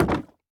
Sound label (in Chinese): 竹木栅栏门开关声